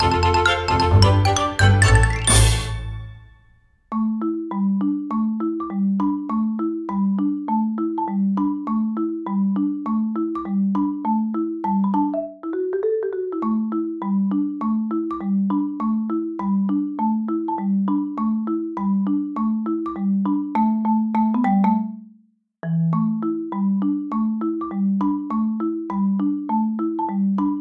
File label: All Clear